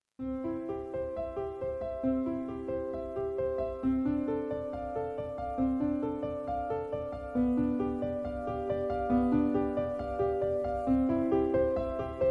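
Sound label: theremin; Аве Мария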